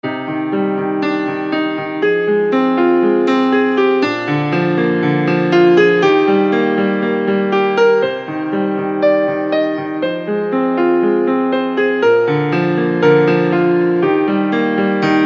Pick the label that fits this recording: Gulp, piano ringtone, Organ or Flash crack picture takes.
piano ringtone